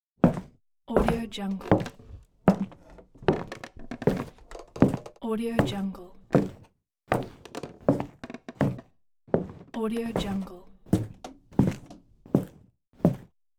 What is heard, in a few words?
footsteps on wood floor